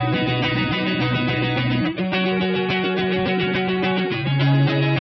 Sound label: informed estim guitar; WASPAA11